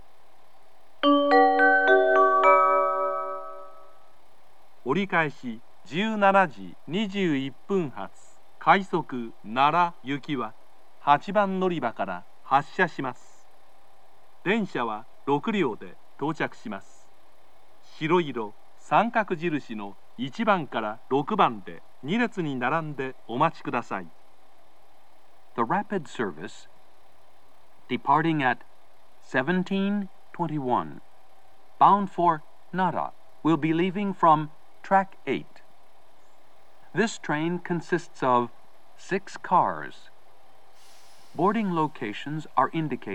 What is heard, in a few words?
turn rapid, kyoto station; nara